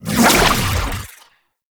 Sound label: Archon Whip Whoosh; Warframe; The New War; Nira